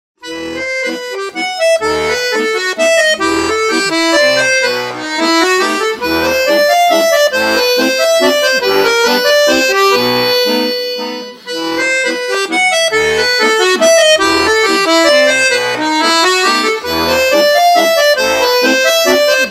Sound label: Waltz, bayan